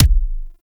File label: Pistol Punch Alt, weapons; Warframe; Thanotech